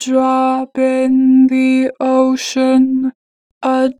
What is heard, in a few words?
A drop, Harmor Vocals; 2 bar flat; Pitch Flat